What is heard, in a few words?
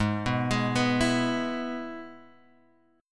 G sharp Minor Sharp 5th, Guitar, Open G; 6,4,6,4,5,x